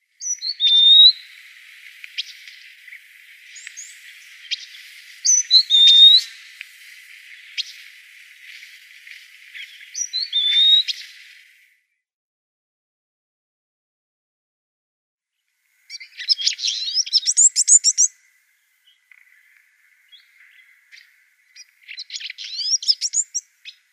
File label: Red-billed Firefinch song